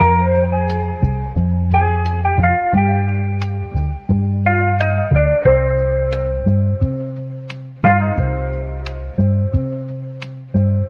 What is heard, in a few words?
guitar, loops; Phonky